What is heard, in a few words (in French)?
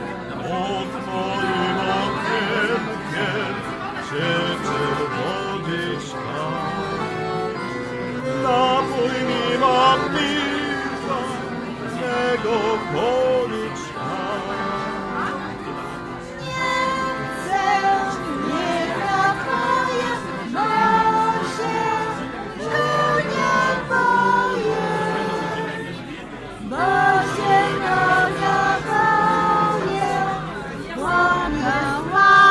musique et chant polonais